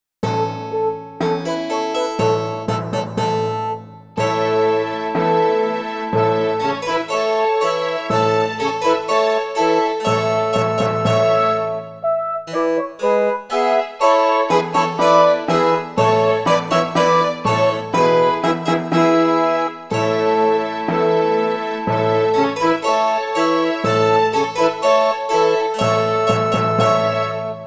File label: March Duet F, Backing